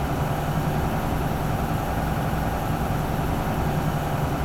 Cp Loop, Train; FCMB 2000; OpenBVE

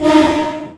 Klaxon, Train; OpenBVE

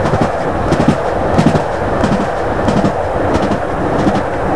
Run, class 66 train; OpenBVE

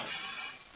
Air, Train; m800; OpenBVE

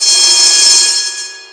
Klaxon, Train; OpenBVE

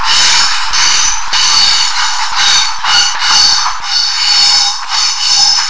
Flange, Train; Cl323 Unrefurb; OpenBVE

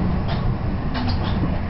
Motor, Train; OpenBVE

